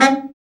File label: BRA BARI, Bariton Sax